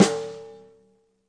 natural sd rim on

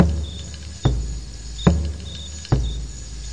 72 minimal tribal, drum loops and breaks; 000 to 080 bpm

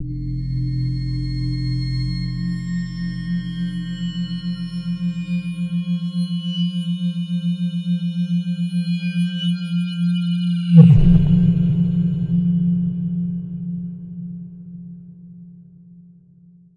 very unstable pad noise; Pads

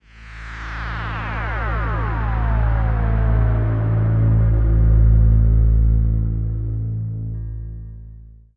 dr who time travel, Electro and Synthetic

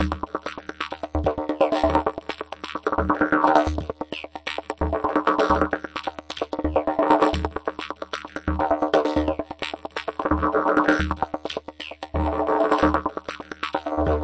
rollerdidge explore, rollertechno

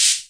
ham cym, Hammond Drum Machine; DRUM MACHINES

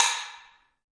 african pe hi

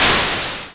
8bit kit explosion; LO FI and 8 BIT KITS; 8 Bit Videogame Kit

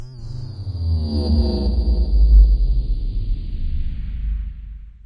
subsonic flyby; Electro and Synthetic

